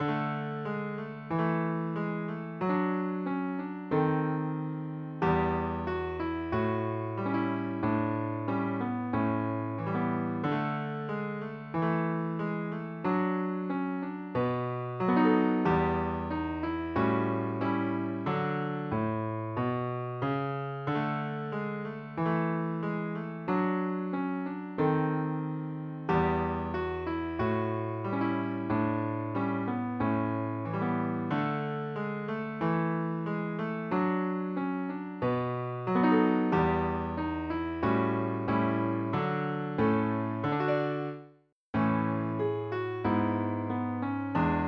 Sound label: guitar